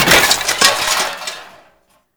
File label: Sentient Bone Destructible; Gameplay; Warframe; Tau; Destructible Dynamic